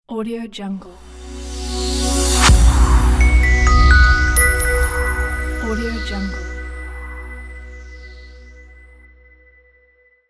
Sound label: nice logo